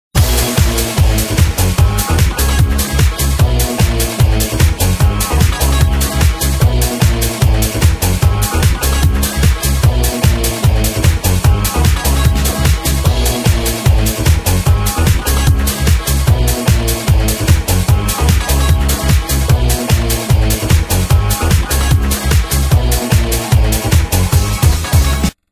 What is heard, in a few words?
disco revenge